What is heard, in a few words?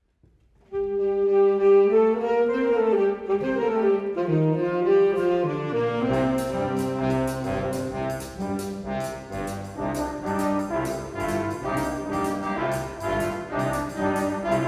Waltz; GCB Spring Concert